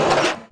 ac switch, Train; OpenBVE

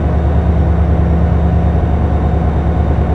Motor, Train; F40PH-2.6

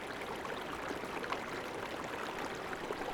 Water; OpenBVE; First Brno Track

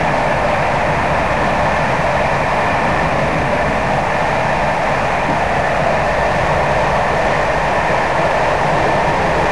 run, Class 47; OpenBVE; Train

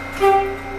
klaxon, Train, R62 Kawasaki; OpenBVE

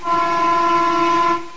klaxon, Train cl314; OpenBVE

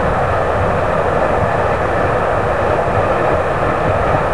Run, class 66 train; OpenBVE

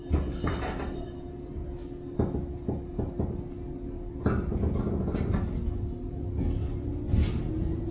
Motor, Train; OpenBVE

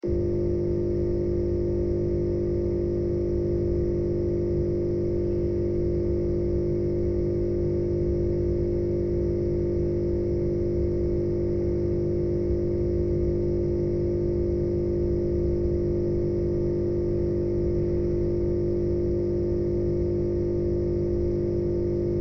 AC Hum, Cl313; OpenBVE; Cl313 Silverlink

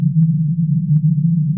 slip loop, Train; Y7100; OpenBVE; bigaré

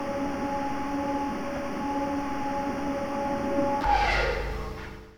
Traction blower stop - Tunnel, Train; OpenBVE; Cl313 Silverlink

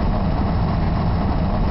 Motor, Train; OpenBVE

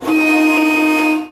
Klaxon, Train; OpenBVE

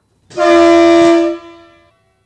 klaxon, Train; Siemens ES64U2 8 Car Pull

